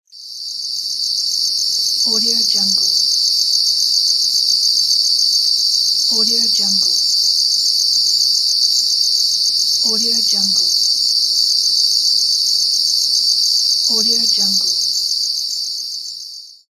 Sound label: crickets